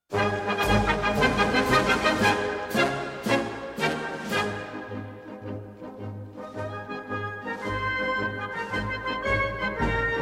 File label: wind band